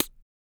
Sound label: HIHAT, MALE PERC; Spectrasonics Vocal Planet; Jazz FX